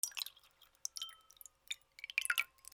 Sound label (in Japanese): ワイングラスに水を注ぐ; L40 ｜食・キッチン